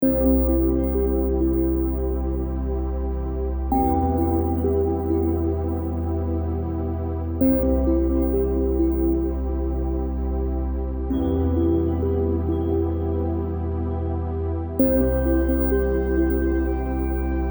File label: Music Level Selection